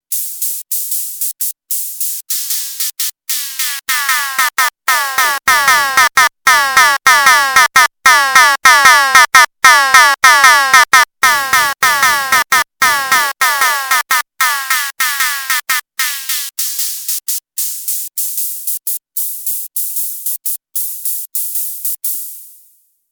Neuron Choke; Audio Damage

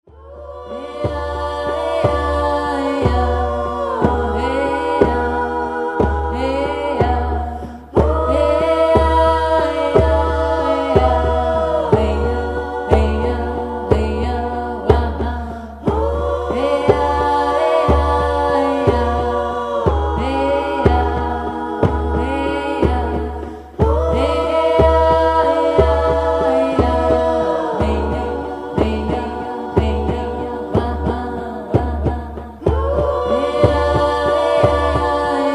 vocal Delay

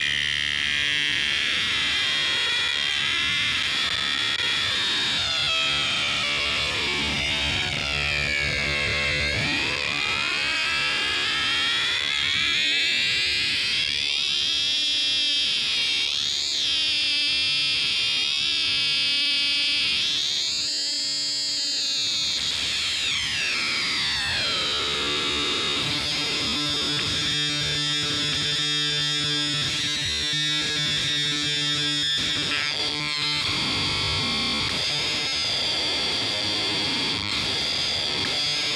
4046 m Ring Modulator; Industrialectric

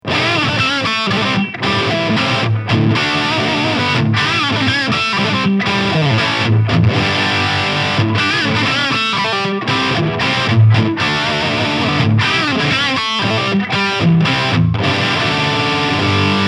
Godin Summit Classic SG Lead

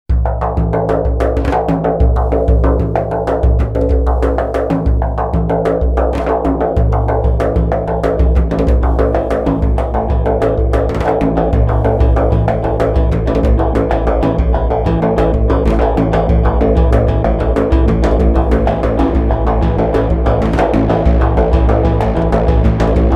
Sequential Prophet X Bendir Plus

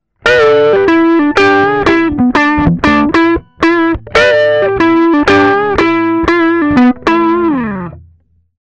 Hi-Z Drive Speaker; RME

